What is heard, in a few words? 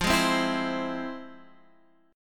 F Minor Major 7th, Guitar Half Step Down, chord